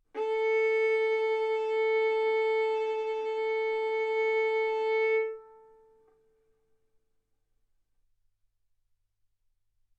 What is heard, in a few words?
Violin arco A4 B4